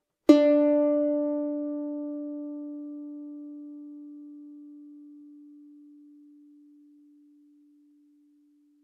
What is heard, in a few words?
cavaquinho